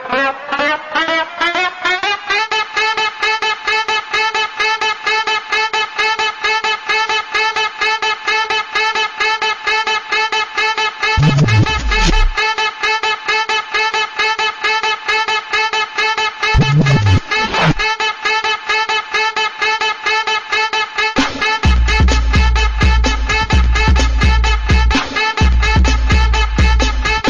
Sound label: saxo tone